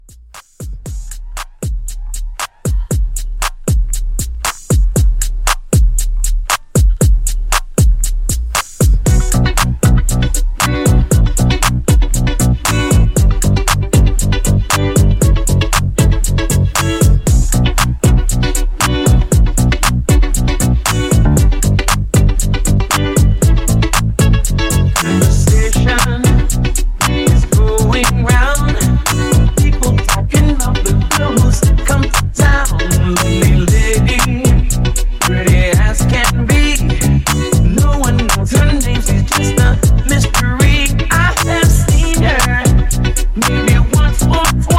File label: 80s Funky Redrum 117